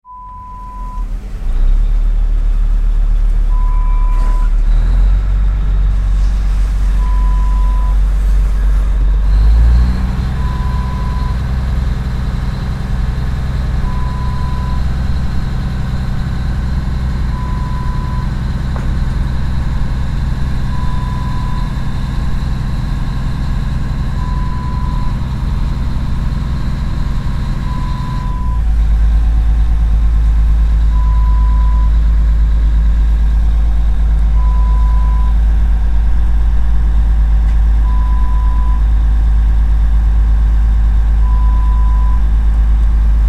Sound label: Tug Boat